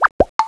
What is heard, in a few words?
Whoop Pop